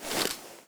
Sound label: m3 draw; weapons; svencoop